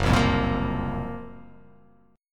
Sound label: Gb Major 7th Flat 5th, GbM7b5 chord; strummed; JGuitar